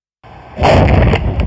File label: noise mix